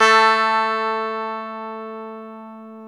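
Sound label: guitar electric 12 string; Club-50 - Foundations; E.12 String; Ricky12Str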